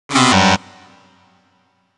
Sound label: Incorrect; Banjo-Tooie